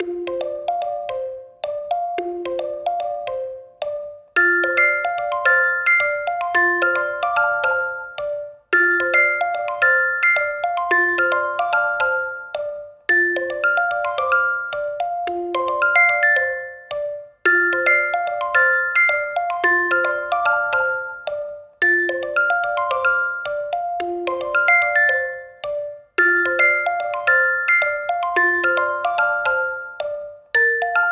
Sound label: Jungle, Moon; super mario maker 2; SMW